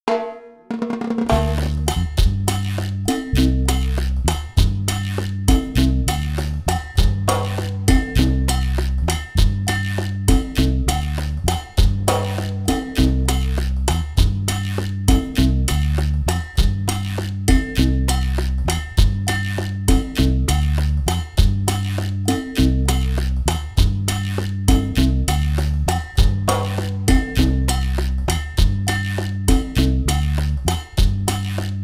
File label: Chachacha